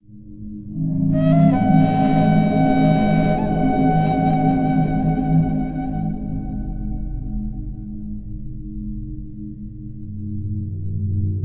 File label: Death Stinger; Battlefield 1 gamerip 2016